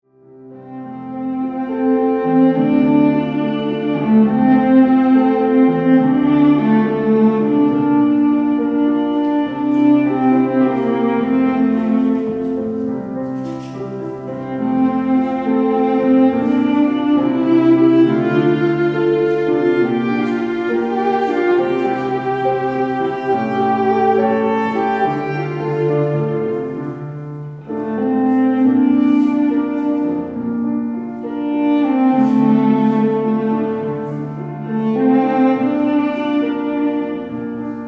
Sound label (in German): Halleluja, viola mit klavier; trauermusik leipzig